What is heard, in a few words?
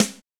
SNARE